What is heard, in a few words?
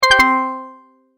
multimedia tone, Goodbye; Sound Design; McKinney Sound